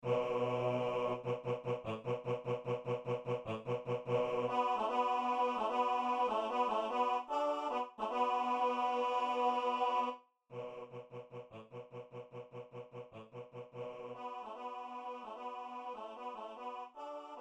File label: Bass; CarminaBurana; SiPuer